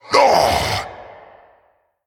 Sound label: Male Elemental Death